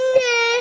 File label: neeee